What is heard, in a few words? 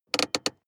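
sports car interior handbrake pull medium long; verkehr-transport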